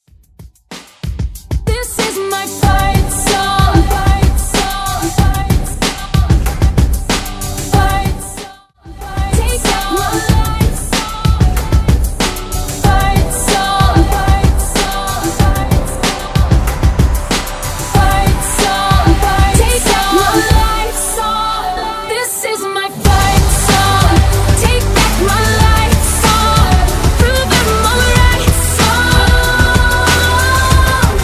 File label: Quick Cut Redrum